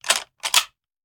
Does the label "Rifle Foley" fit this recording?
Yes